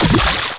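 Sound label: hit water; Hexen2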